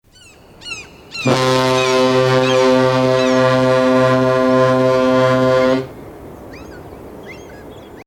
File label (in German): Schiffshorn